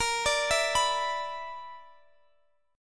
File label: B Minor Major 7th Double Flat 5th A sharp, chord